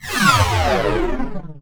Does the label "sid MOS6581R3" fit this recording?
No